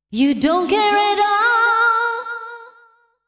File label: deep house female, 125, A, vocals